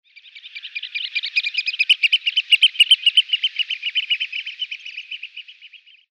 Austernfischer Haematopus ostralegus; Vogelstimmen

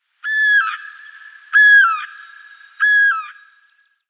Haeherkuckuck Clamator glandarius; Vogelstimmen

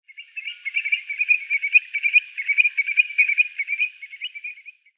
Triel Burhinus oedicnemus; Vogelstimmen

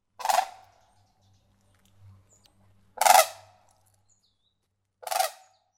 Jungfernkranich Anthropoides virgo; Vogelstimmen

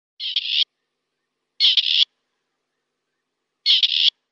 Rebhuhn Perdix perdix

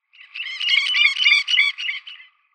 Rotkehl-Strandlaeufer Calidris ruficollis, Vogelstimmen

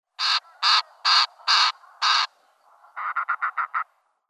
Buntfuss-Sturmschwalbe Oceanites oceanicus; Vogelstimmen

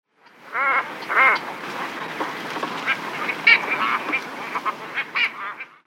Zwergscharbe Phalacrocorax pygmeus; Vogelstimmen

